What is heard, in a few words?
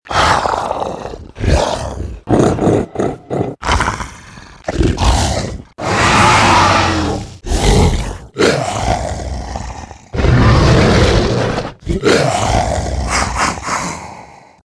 alien